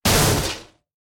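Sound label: real undead warrior damage up, monster; thedead3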